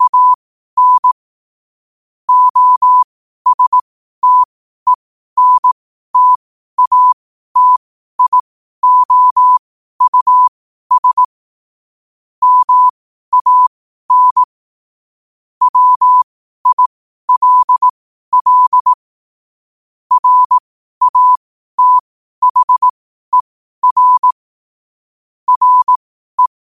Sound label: 5 wpm, morse